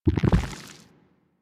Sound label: Nunchuck Pulse, Weapons; Warframe; Infested